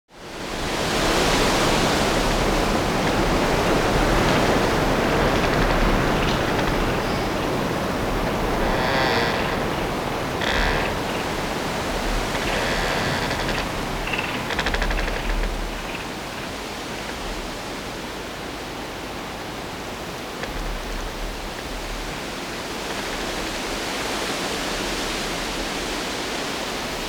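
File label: storm tree creaking in the wind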